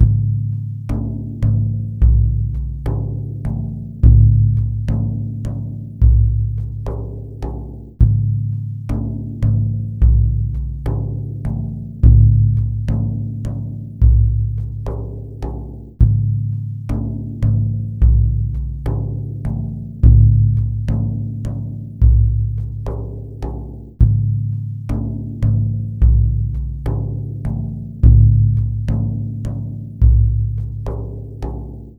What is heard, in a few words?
Frame Drum